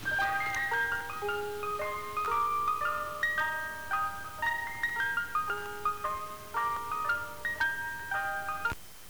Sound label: softee jingle